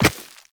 Walk Rock, Footsteps; Operator

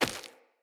Grunt Step Default, Footsteps; Enemy; Characters; Corrupted Sproutfolk

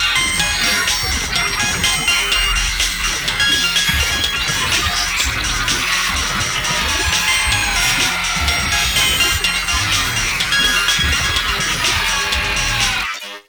Mutalist Speaker Attack Scream; Warframe; Proto Infested; Abilities

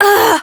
Generic Short Pain, Operator Voice

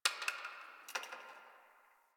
Ice Crack One Off

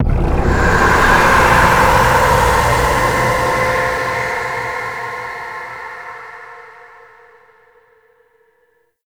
Hex Appear; gameplay; Warframe; Nineteen Ninety Nine; Stage 11 Future Past

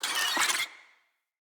Infested Ashen Vocal Pain; Enemies

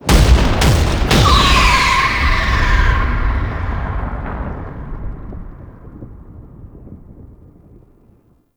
Cannon Close; Ambience; Gameplay; Makvoss; Warframe; Tau

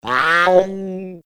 Pet Meow; Enemies; Warframe; Catbrow; PetShip

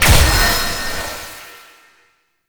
Shock Activate; Warframe; Eximus

